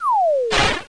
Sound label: Shut Down; Paris Virtual Tourism